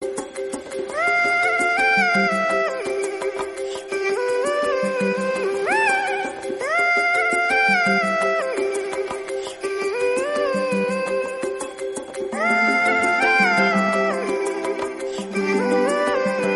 lobby music; coral chorus; fortnite

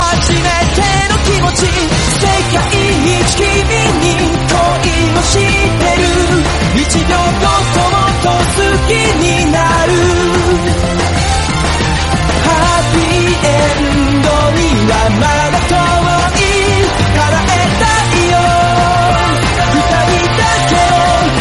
anime opening

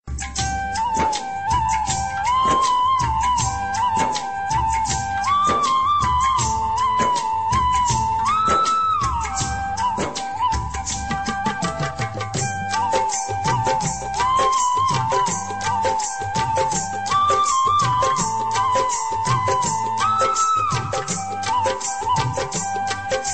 fluet

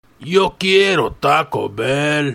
gong; taco bell

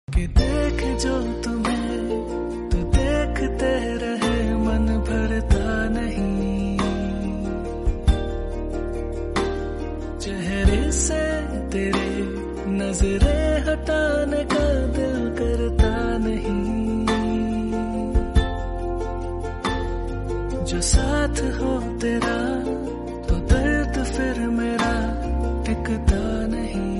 twin strings

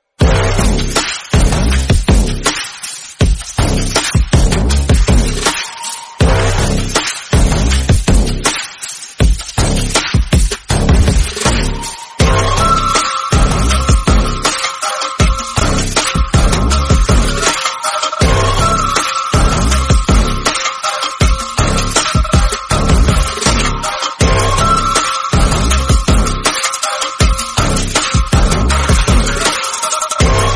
bgm theme; maanadu

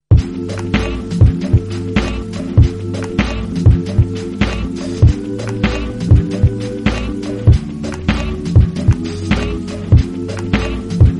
battlecry

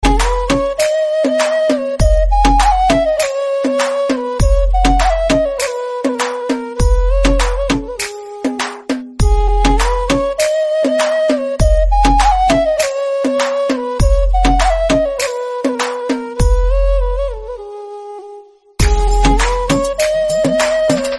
instrumental sad flute

